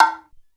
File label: R-8 TUZUMI H, ethnic; Hollywood Edge; The Big Bad Beatmachine